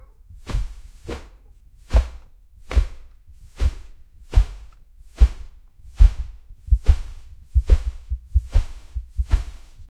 sound of a gaint carpet, packing

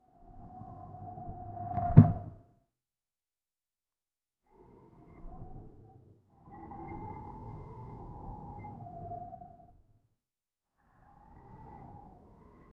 ambient mountain wind armor

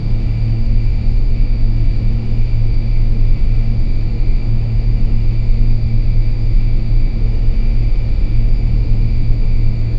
low frequency heavy train; 3d audio

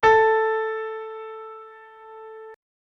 melody piano notification; instruments

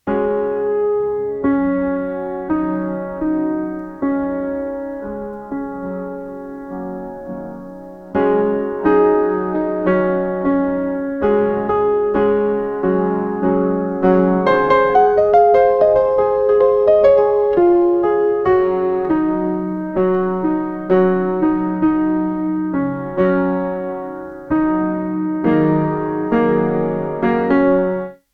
piano theme, ballad

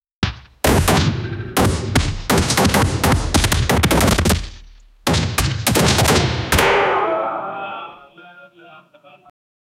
medival dungeon battle

